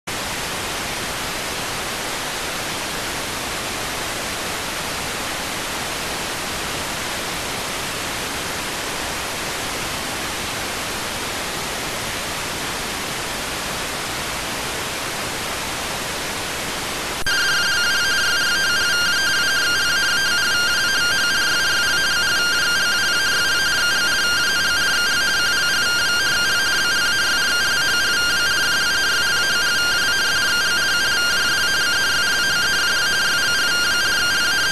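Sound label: radioteletype receive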